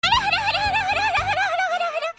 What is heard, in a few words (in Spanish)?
risa horo horo horo horo; one piece; perona